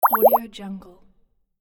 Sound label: pop bubbles; audioheart